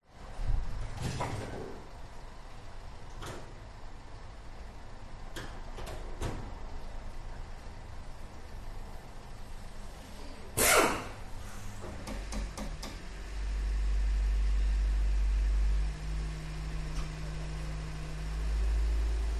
auto repair shop atmosphere car engine idling revving air ratchet burst; Sound Ex Machina